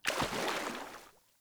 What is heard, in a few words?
Sandals Land Water, Footsteps; Characters; Player